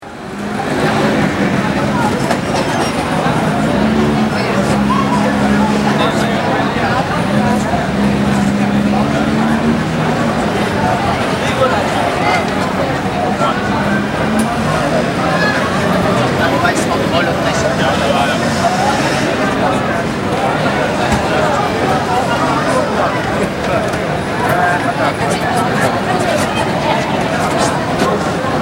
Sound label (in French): son trottoir; prototypes sonores architecturaux